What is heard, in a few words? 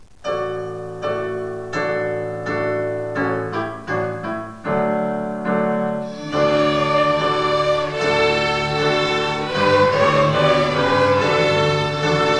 Allegro